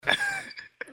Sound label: Chuckle